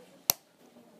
entsichern

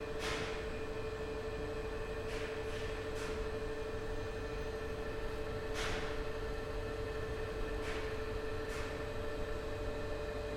Fabrik, industrielle Baustellen Schallwellen

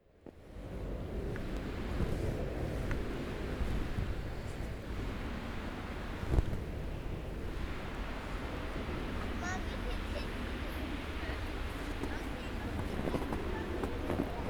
Kind

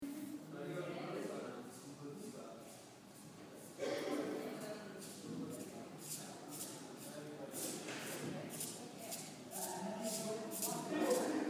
Schuhe

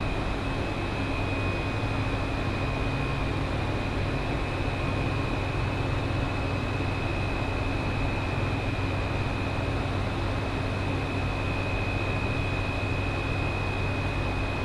Fabrik tinitus, industrielle baustellen schallwellen

